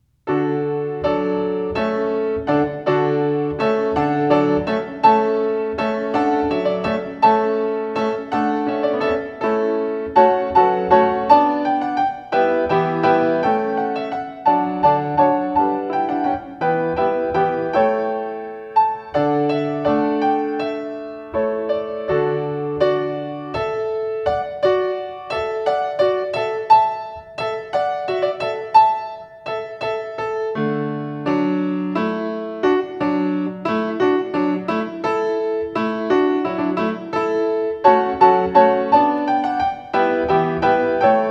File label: With Trumpets Horns, pianist; wedding; Handel